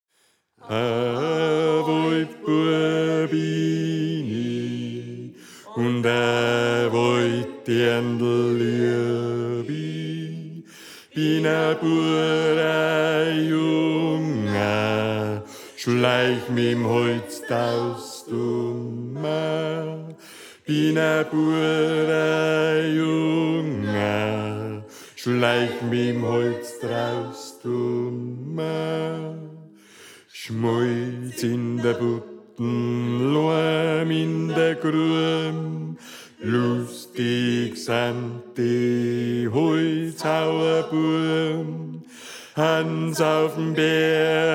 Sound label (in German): Bass; A Waldbua Bin I